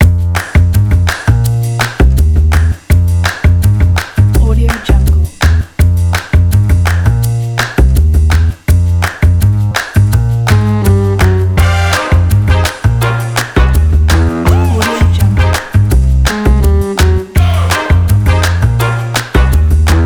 elegant funk jazz bass; mr-estetic

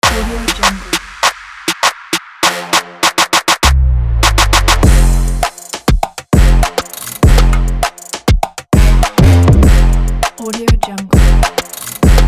dynamic; blue eagle audio

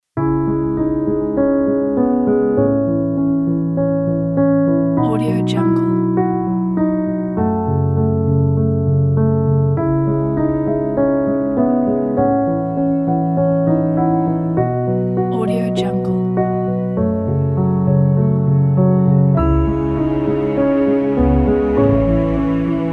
a sad emotional score

